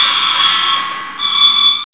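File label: SQUEAL M 124 BPM; MACHINES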